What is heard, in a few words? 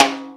Timb, Yamaha RY-30, Percussion; Drum Samples